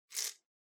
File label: Spice Mill Pepper Shake